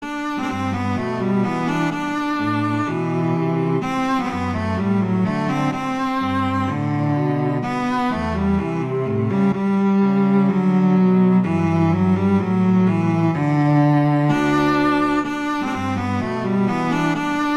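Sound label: Etude, cello; Popper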